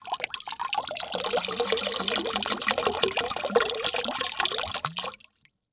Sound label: Liquid Water Pouring Into Water In Plastic Bucket From Bottle Slow